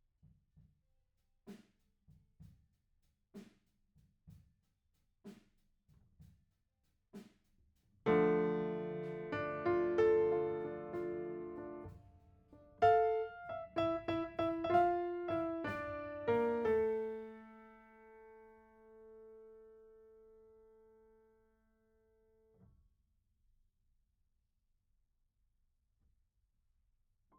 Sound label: Piano B